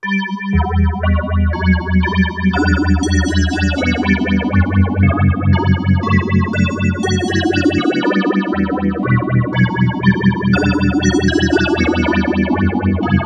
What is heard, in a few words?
DX7 bells, Moogerphaser